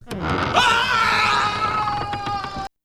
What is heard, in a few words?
generic guy fall and scream